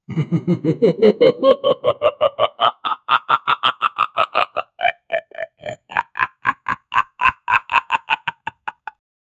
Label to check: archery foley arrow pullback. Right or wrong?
Wrong